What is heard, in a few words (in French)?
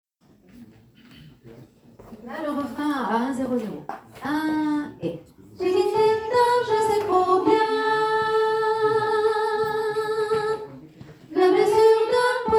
Sopranes; Sequence; Refrain